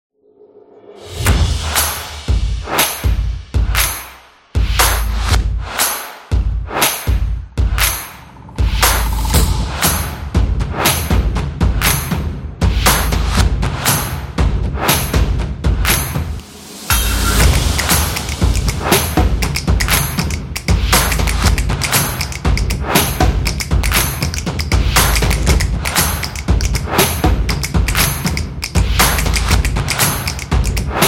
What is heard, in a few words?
Boxing